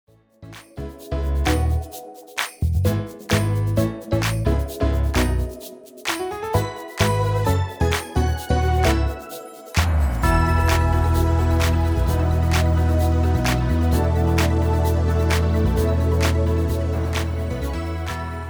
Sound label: Drumless BPM 130